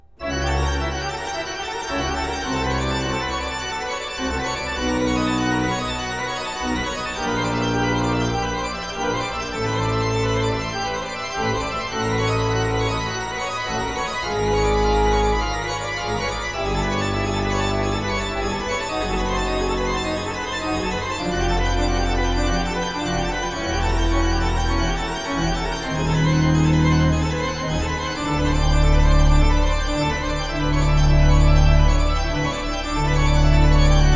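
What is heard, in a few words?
Fantasia in G major, organ; Piece D'Orgue; Lentement; Johann Sebastian Bach; Simon Preston; BWV 572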